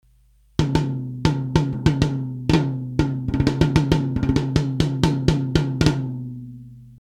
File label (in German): 10 x 5 Tom dynamisch; Kirchhoff Test